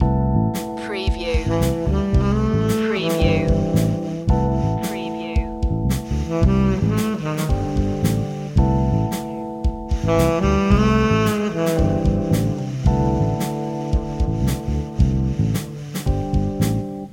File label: loving sax loop